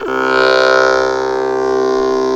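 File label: VOX -R, vocals, Drum & Bass, 160 BPM; Ueberschall; Drum & Bass Resonance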